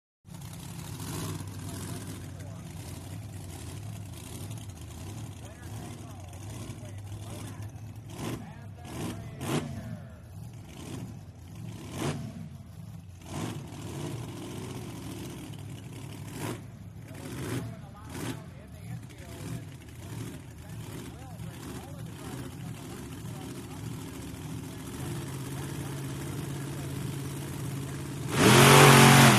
Monster Trucks; Racing Ambience, Pa, Crowds, Monster Trucks Idling, Revving, Then Race Thru The Obstacle Course; Shot Cu From The Obstacle Course.